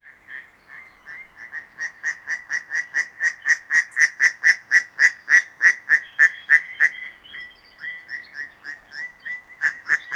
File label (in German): 6 Enten quaken entspannt; duck